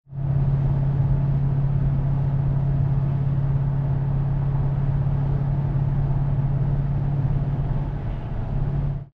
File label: Roush Incar Highway